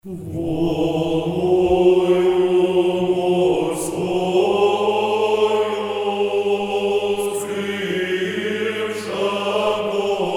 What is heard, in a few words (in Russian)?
Ирмосы в навечерие Рожества Христова глас 6; Волною морскою